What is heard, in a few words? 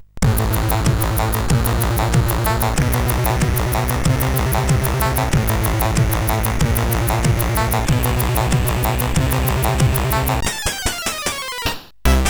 Loader Remix, sid CSG8580R5; Die Hard Loader Remix; Sonic